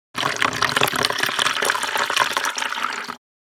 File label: Water pour into plastic bucket; gfxsounds